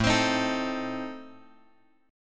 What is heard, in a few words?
A Diminished, guitar; Adim Chord {5 X X 5 4 5} strummed